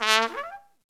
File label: SOLO TRUMPET